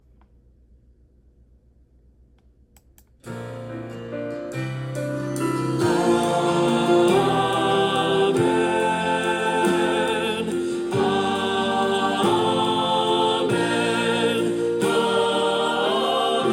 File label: Amen